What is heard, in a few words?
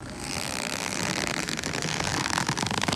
thick leather stretching and creaking; cloth